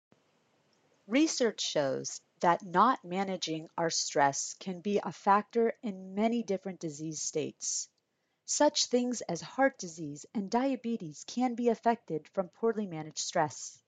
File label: Breathing